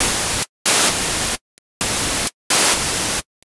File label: Gated Seq Noise, Gate Filtered 130BPM